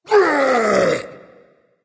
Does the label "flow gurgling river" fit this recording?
No